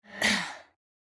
Hunter Grunt Jump; Realm Royale

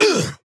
Grunt Jump; Drogoz Dreadhunter; Paladins

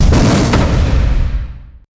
Chaos Bolt

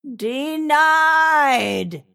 deny pain; Snapfire; Dota 2

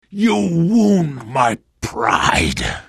death; lion

